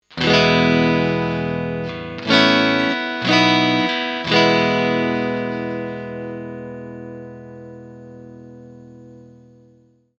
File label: Film Clean